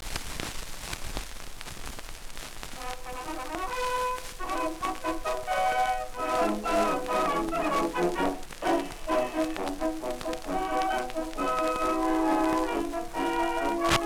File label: Coldstream guards band, Strauss March, gramophone; 1908